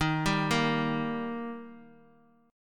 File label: D sharp Major D sharp, chord; arpeggiated; x,18,17,15; JGuitar